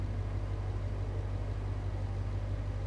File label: idle, vehicles; TDMCarpack; slsamg